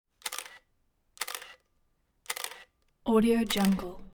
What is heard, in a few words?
camera shutter canon eos a2 clicking whirring